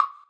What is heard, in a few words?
Wood, Block Stick; Equipped Music; Loungin House